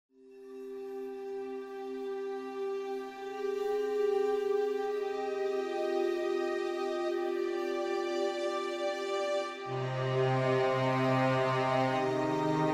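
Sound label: Ambience Mediterranean; Titan Quest Immortal Throne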